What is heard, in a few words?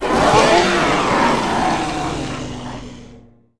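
creature acklay attack heavy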